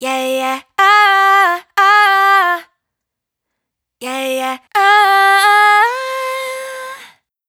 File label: riff Yaya Fm, Dry Vocals; Raw Vocals; Slate Digital Omnivox Vocal Sample Pack